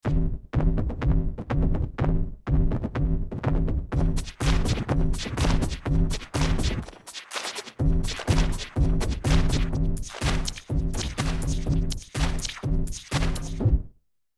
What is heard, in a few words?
Drums L pitch 500 cents R pitch 950 cents